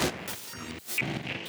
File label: glitch, Digital FX